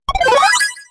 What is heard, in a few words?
next Wave; alien-rush